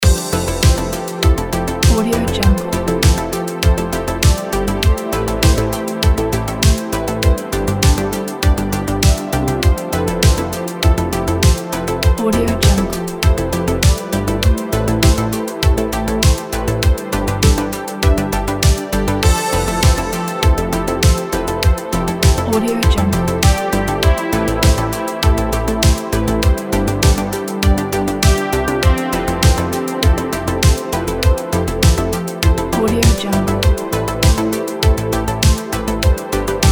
dreamy retrowave music

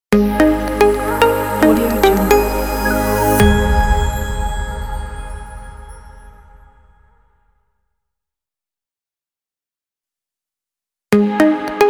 technology podcast logo music

